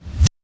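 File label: bass drum Reverse, Kick, Yamaha DTXpress-II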